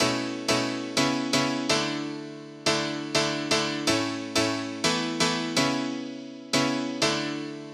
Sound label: Piano Top B 124 Dry